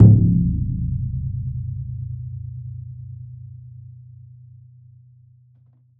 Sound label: o daiko hit thunder mud; TKO